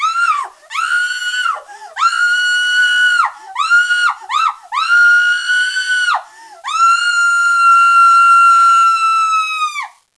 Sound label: scream; women